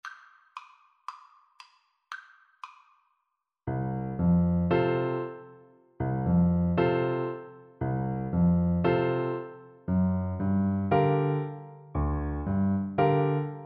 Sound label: por, Trombone